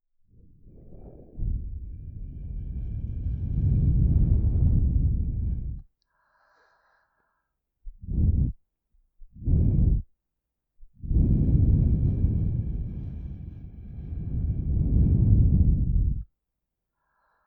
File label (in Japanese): ストローで お耳 ふ～ふ～, ASMR